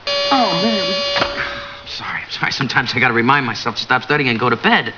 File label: alarm